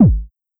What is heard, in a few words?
energy Release